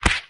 Grab chest; Zenonzard

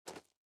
SFX run gravel; out of reach treasure royale 2020 gamerip pc

